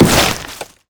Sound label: frost flamebreath; LoL; shyvana